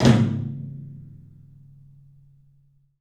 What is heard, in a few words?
TOM, 3D Drums, CLUB TOM; 3D Sound; CLUB 12 TOM